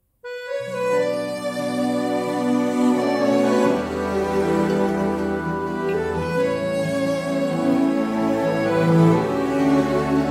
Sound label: ai cover song hindi song